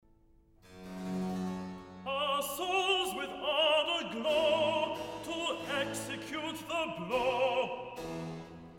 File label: Recitative; George Frideric Handel; Esther 1718 version; Purim; 'Our Souls With Ardour Glow'